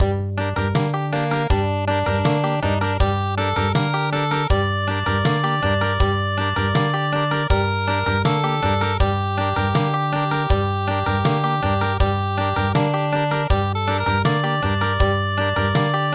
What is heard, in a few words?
Country; The Nanbu Cow Driving Song